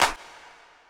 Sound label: CLP, Disco Fever drum samples; Best Service ProSamples vol.48 - Disco Fever; AKAI